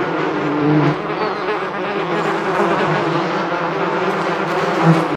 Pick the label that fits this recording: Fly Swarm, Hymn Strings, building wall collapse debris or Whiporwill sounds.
Fly Swarm